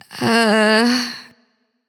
confused; smite; artemis

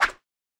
minion sword; smite; npc; roman archer

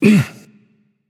grunt jump; smite; heimdallr

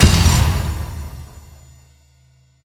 chariot impact; smite; Apollo

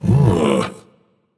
nv ugh; smite; voicepack; ganesha

